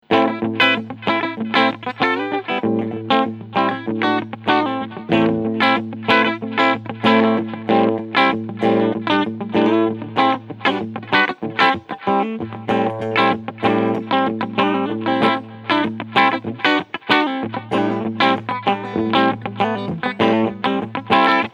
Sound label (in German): Cleansound Steg PU